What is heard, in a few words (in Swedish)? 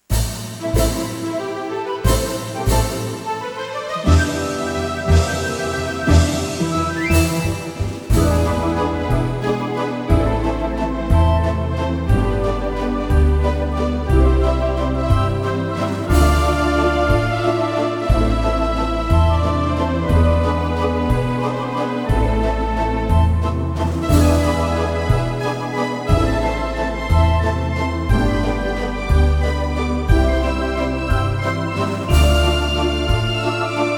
Vals